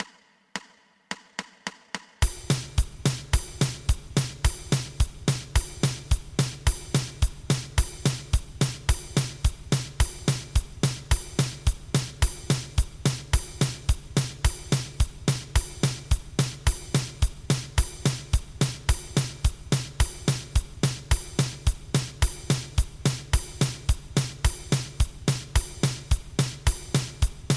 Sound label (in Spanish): 2 pulsos